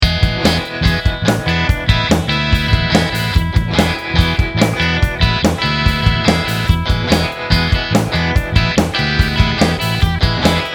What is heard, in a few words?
strat